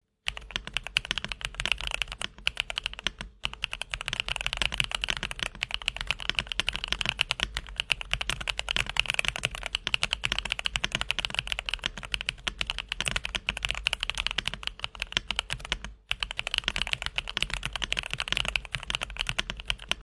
Akko V3 Creamy Yellow Pro Switch, mechanical keyboard; MonsGeek; What is the Best Mechanical Keyboard Switch